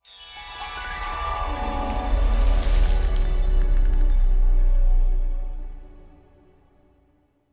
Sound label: start Screen Continue; fairyhansel